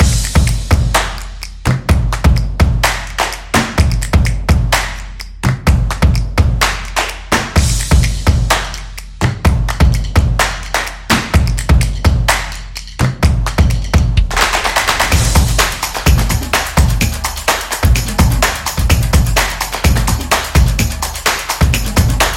Energetic Clap; percussive nation vol 3

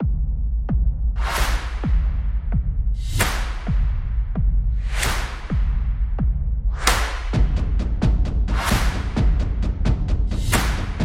Suspense Beat, dark

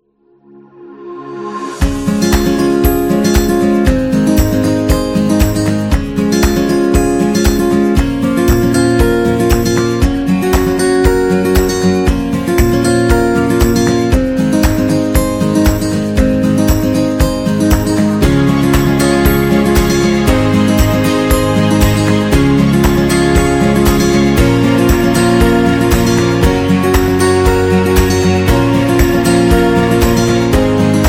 Love Happy; wedding music

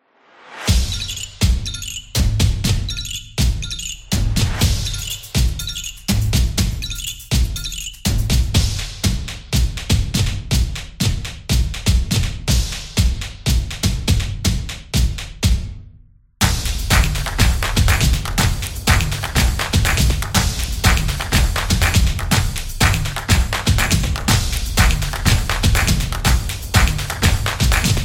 Drums More

